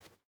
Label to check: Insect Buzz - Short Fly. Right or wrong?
Wrong